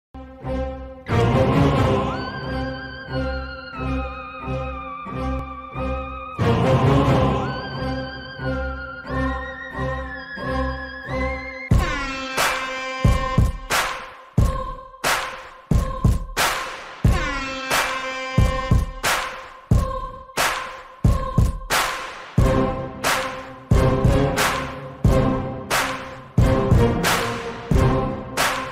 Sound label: mankatha bgm ringtone remix, ringtone